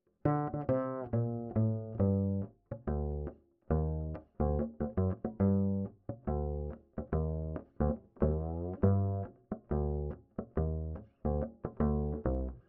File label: Bass Line